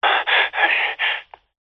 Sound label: cb radio male sudden panic breath speaker pov